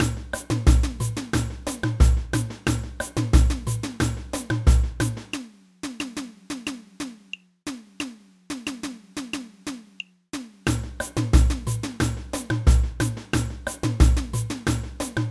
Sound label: tamborim, samba; samba band